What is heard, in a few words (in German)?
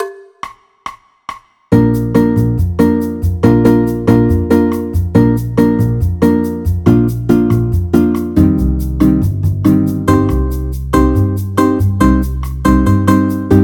bb dur stufentonleitern